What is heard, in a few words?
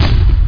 enemy boom, tank; fctank